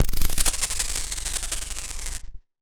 Casio, Glitched, malfunctioning synth

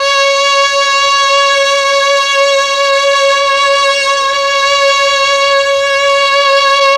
MEL STR, Legendary M400; ILIO; Propeller Island

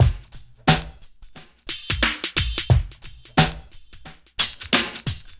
BREAKB; Spectrasonics; Liquid Grooves 1 & 2

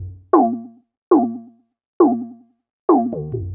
Bubble Toms; Session 10 - Come In Hard

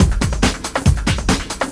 Break Beats, 140 BPM

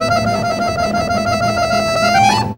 ACC TRILLER; Best Service; World Colours

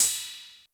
Crash, Yamaha RY20

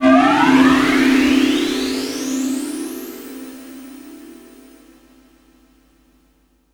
ROCKET, SF EFFECTS; Q Up Arts; Dream Experience

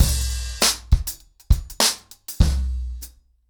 Drumkit 100BPM, ACOUSTIC DRUMS; Sonokinetic - The Roots Drumloops

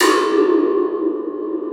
Spring Dr, EMU Orbit-3 drum machine; 300 Drum Machines

